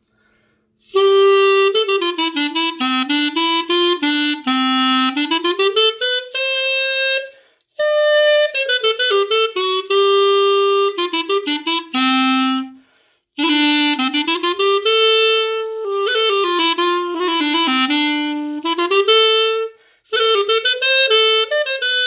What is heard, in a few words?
Chalumeau